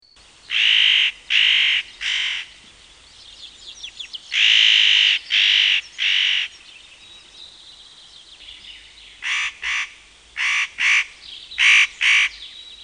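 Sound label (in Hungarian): Fenyőszajkó; Madarak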